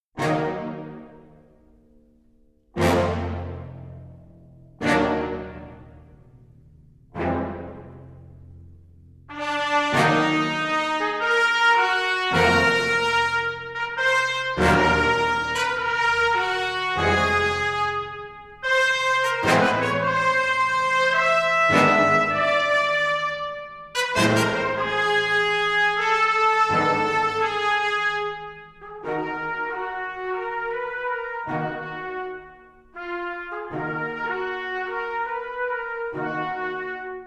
Theme Tune

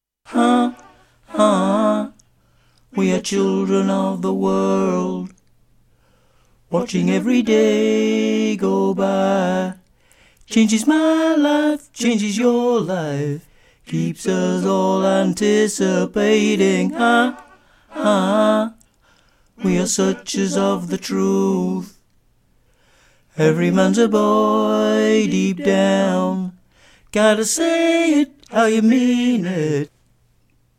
Alto; Choir Harmonix; Harmonies

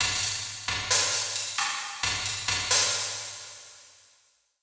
drum kit; Diffusion; Processed

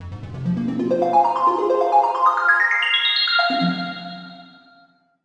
synth; Diffusion; Processed

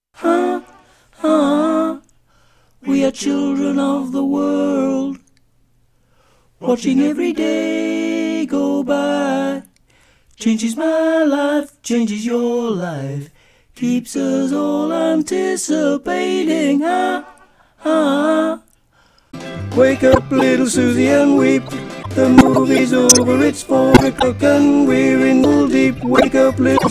Soprano; Choir Harmonix; Harmonies

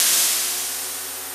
Shrinking Membrane; DynamicDWM

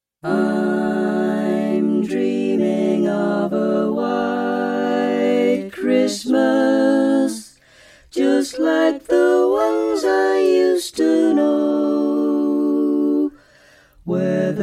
Alto; Choir Harmonix; Harmonies; Carols

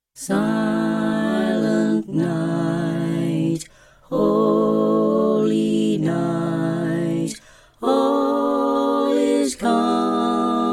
Alto; Choir Harmonix; Harmonies; Carols; Silent Night

